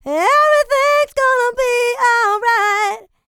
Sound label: LICKS, Vocal R&B & Dance; ILIO; Vocal Planet